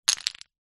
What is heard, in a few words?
leisure puzzle pieces few down on ground; jenga; zapsplat